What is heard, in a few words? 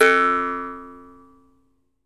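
Jaw Harps, Pitched Percussion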